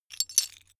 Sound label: metal pieces clatter short